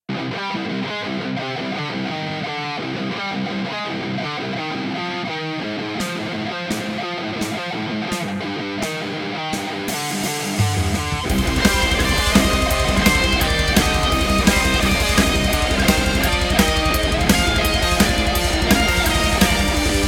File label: metal